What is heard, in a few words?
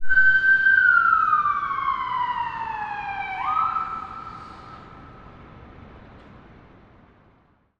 Siren Ambulence Short Distant Pass Stops City, Toronto; Videontg